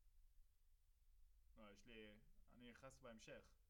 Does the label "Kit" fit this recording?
Yes